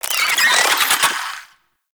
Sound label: Infested Ashen Vocal Attack Death; Warframe